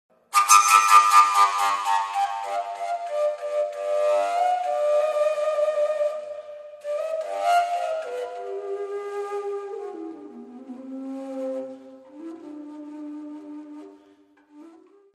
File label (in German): Fujara